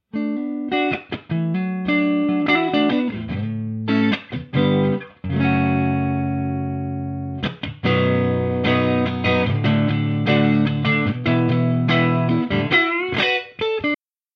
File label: clean